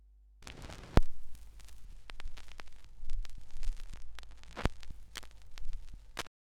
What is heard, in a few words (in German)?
schallplattengeraeusche; rauschpartikel